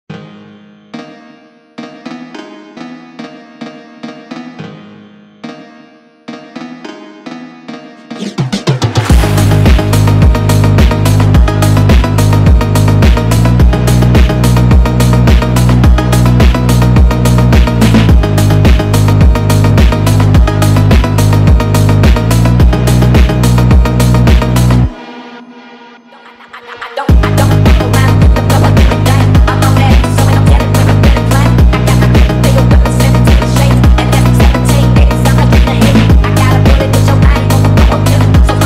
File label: phonk sped up